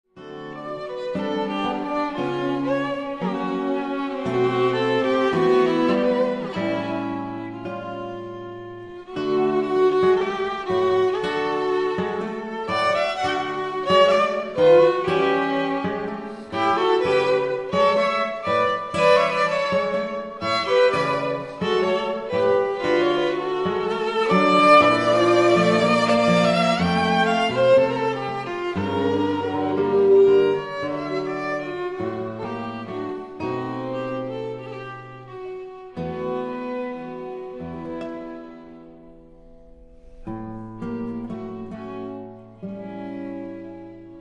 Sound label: Trio Arpeggione Tango; Albeniz